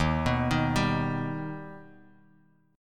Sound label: Eb Augmented Eb; arpeggiated; JGuitar